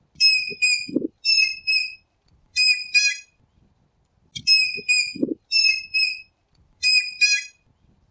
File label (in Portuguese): Canto da Maria faceira